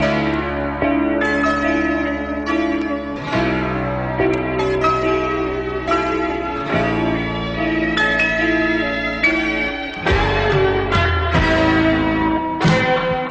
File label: guitar, Loops Sad